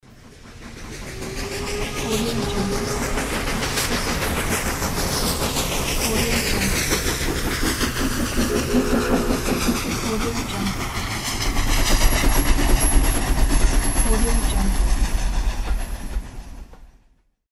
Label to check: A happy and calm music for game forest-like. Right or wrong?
Wrong